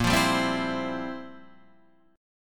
F Major 7th Suspended 2nd Suspended 4th, A sharp, guitar